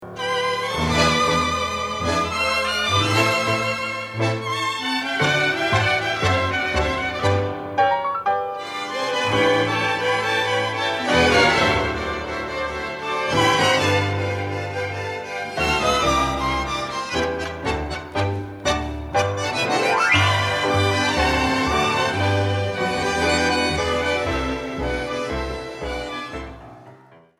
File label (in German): klassisch